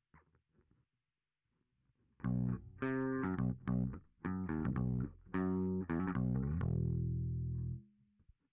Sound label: Bass Sansamp